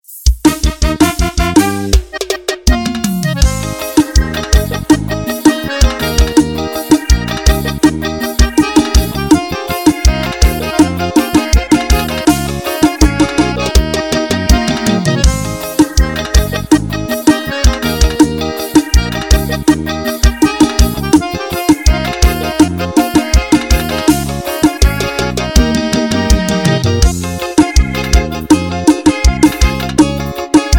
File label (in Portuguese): forro